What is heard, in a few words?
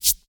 popup open